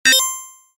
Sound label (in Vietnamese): tieng nhat tien xu trong game